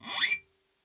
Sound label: scrape, glowly bass